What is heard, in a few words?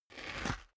step boots concrete grit scuff; schritte; Footsteps